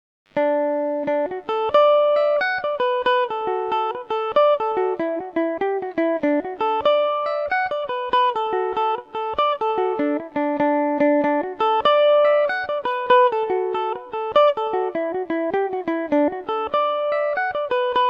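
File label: D major revelation, irish mandolin; jerrys beaver hat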